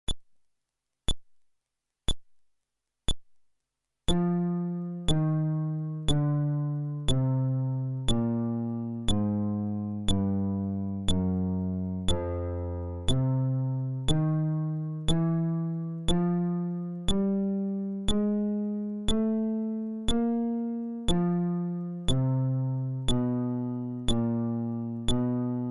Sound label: Scale, fretless bass